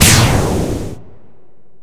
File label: laser slam; ExperimentalGear